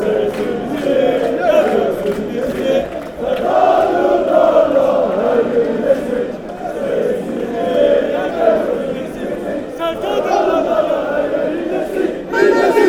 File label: youth anthem, fanchants